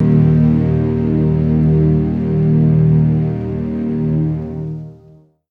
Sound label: sounds music; Halo Combat Evolved; Xbox